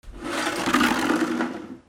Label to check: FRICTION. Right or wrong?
Right